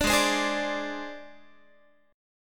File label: G sharp Major Flat 5th, Guitar; G#Mb5 Chord